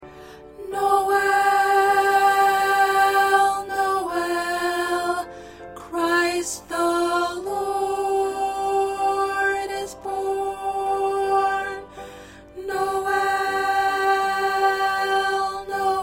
Alto

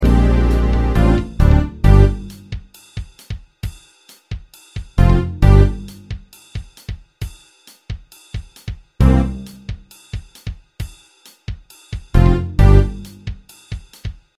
Accompaniment

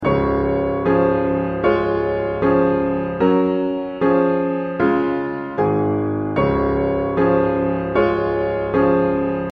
Piano

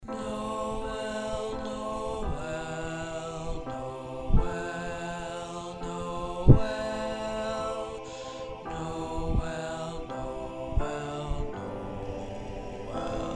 Second Bass; Nowel

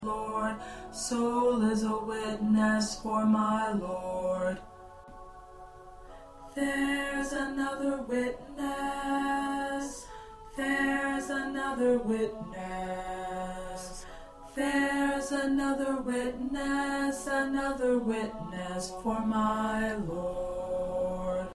P Witness 2nd Tenor

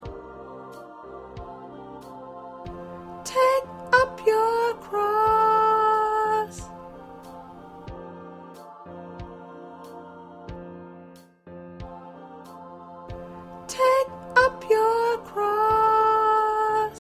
Backup Soprano

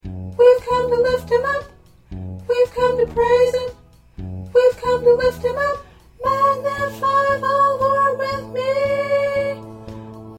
Soprano

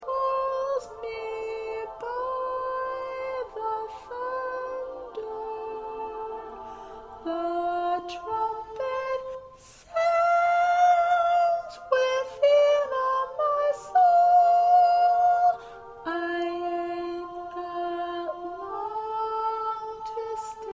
Lead

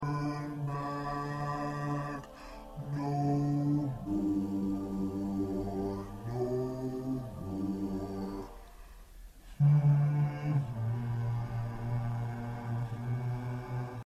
Bass

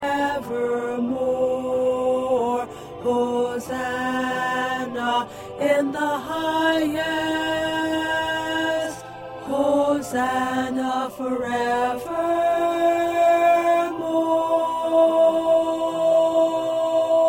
1st Tenor

